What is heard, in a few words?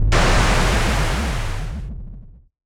Retro Impact Water